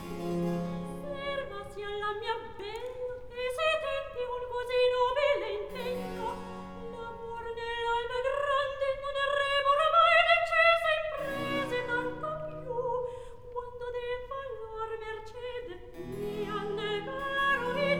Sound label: Recitativo; Act Three, Scene 5; Servasi alla mia bella; Floridante